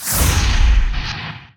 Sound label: fire wreath layer; weapons; dota; dawnbreaker